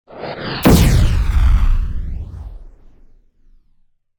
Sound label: Explosion Blast Short Detonation; Hollywood Edge; sound designer's toolkit v2